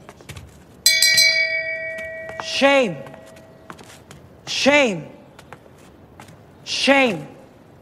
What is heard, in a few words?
shame bell